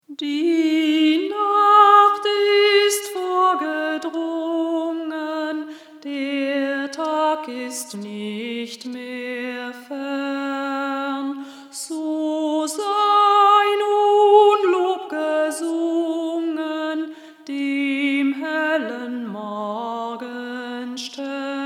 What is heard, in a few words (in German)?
Vocal; Die Nacht ist vorgedrungen